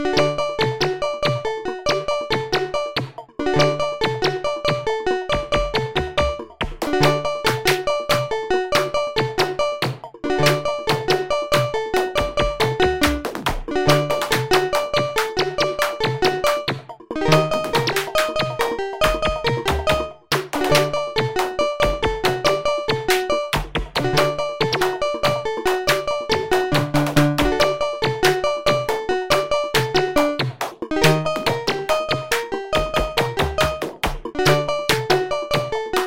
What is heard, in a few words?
silly chase 140bpm